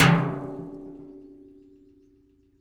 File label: hit, rust kit; dry; Nucleus Soundlab; Ambient Drums